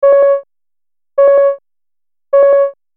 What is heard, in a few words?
notification